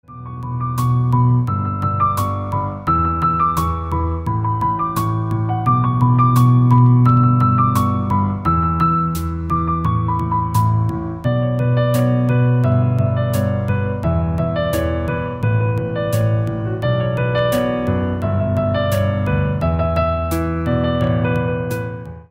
piano cover

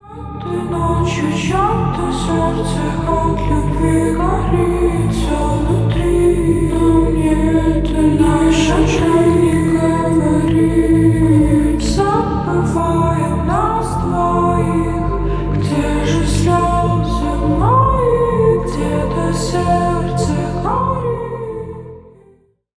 slowed reverb

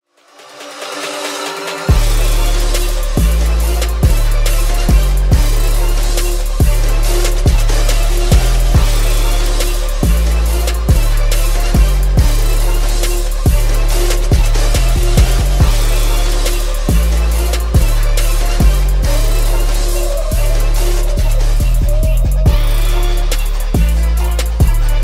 trap remix

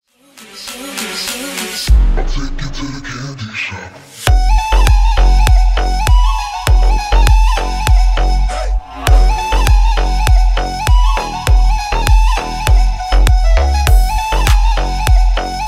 bass boosted remix

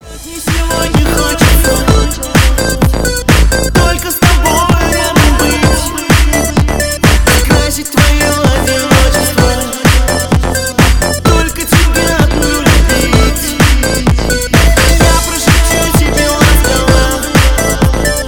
electro house mix

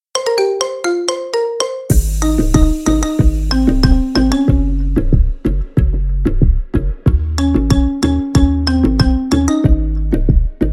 marimba remix

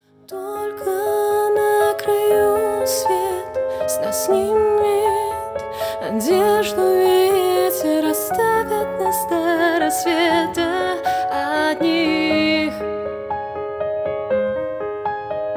acoustic version

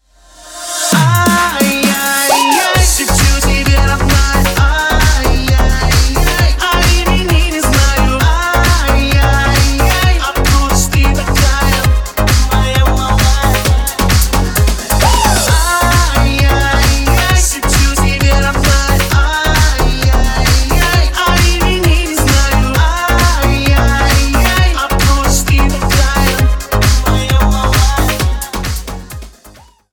remix

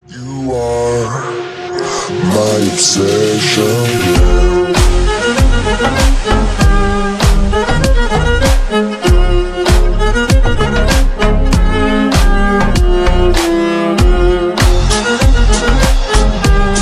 slowed

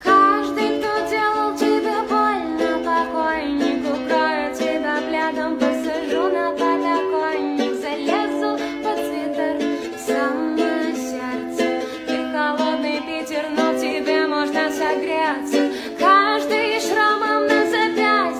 acoustic live

